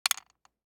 DICE on Wooden Tray, Throw and Roll, Mini, 2 Two Dice; Samples; ArticulatedSounds; DICE Sfx Party (Extended)